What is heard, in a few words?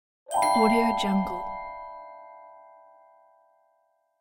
correct answer; winds productions